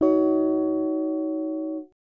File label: guitar